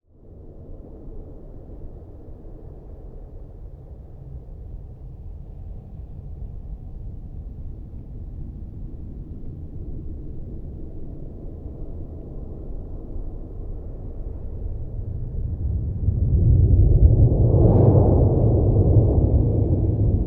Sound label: Ocean Hydrophone In Sand Large Near Single, Cascadia Shore Waves; Nick McMahan